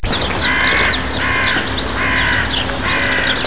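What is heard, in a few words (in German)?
Krähe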